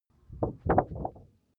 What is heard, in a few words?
paper wobble strong